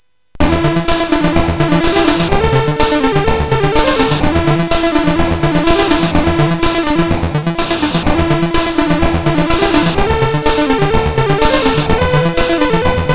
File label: Synth Sample III, CSG8580R5; hvsc